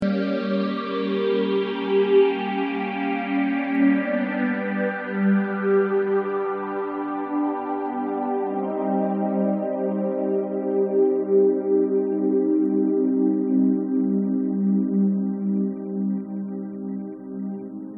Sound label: Korg N1 Strings, phase shifter